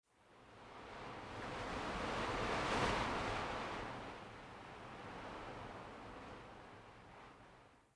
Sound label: Under City Wind Airy Gust; Ambience